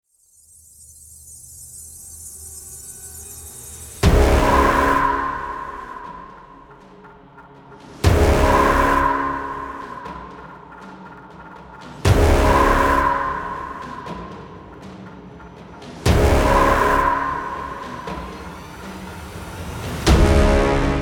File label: Epic Hybrid Trailer